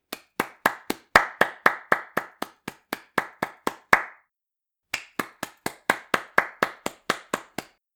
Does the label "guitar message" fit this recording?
No